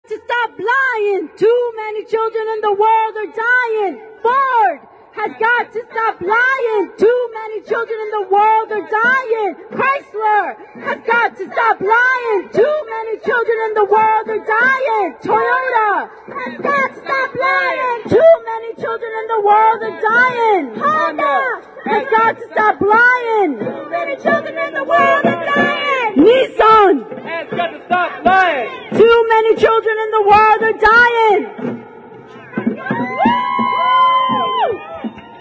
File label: corp chants